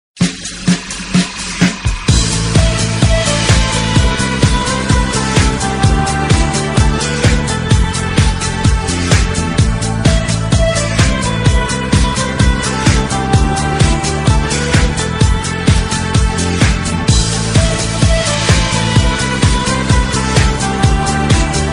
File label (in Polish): ton muzyczny piano